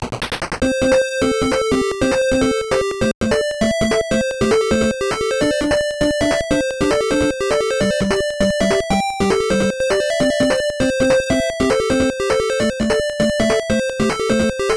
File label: Congratulations!; ducktales 2 nes